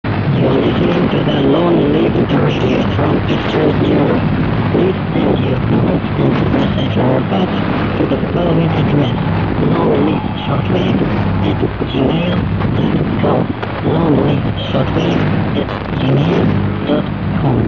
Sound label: Radio 5800 kHz; Long Life Radio